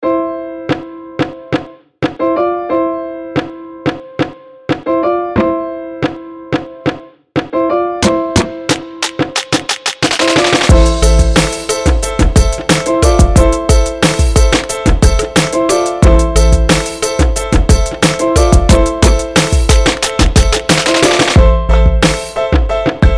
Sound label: boombap90